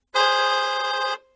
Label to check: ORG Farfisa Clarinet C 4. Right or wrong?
Wrong